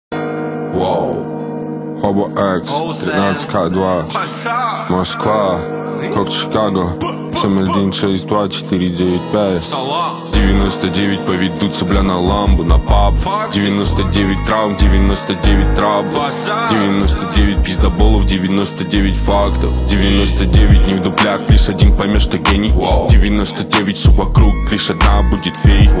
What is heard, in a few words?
castle music